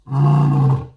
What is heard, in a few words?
Wildcats Lion Bellow; BL-FREE30 Wildcats